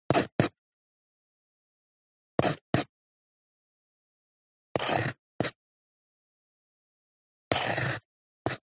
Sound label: Handwriting Reed Pen Papyrus Short; D&H; soundbox library